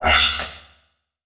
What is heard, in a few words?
Multimedia Internet CD-Rom Flash Whoosh Short